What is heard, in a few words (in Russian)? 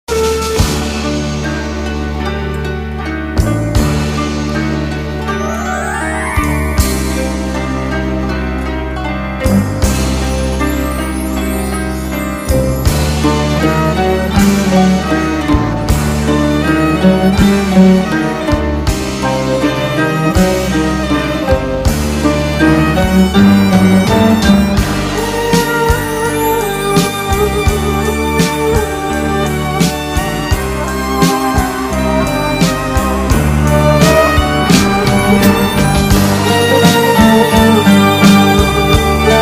Красивая восточная мелодия на звонок телефона